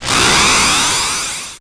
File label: Title Transition Ascend; Adobe; Production Element